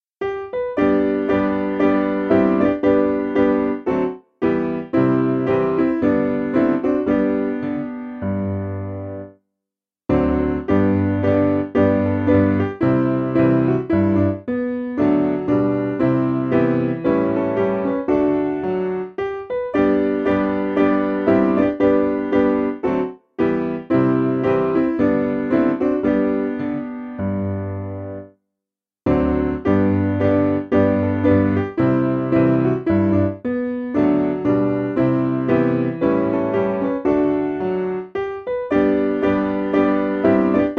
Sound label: S Piano